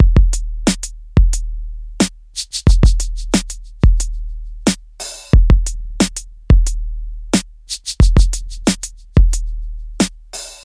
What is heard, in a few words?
90 bpm breakbeat d sharp key, kick tuned to the D# note